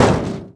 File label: bouncy aircon hit; bouncy objects; Tony Hawk's Project 8; XBOX